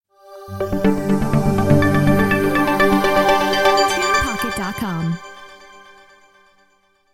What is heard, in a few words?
Trendy Audio Logo